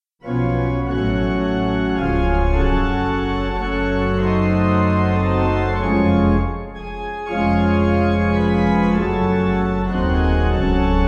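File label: pipe organ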